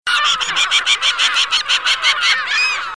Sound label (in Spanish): gaviogris; cantos